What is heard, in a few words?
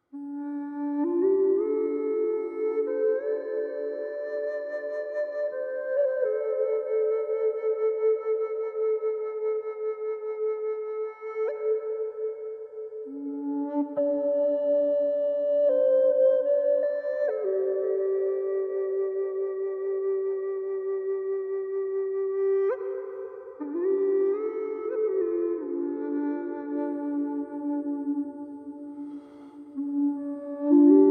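D with Reverb, drone flute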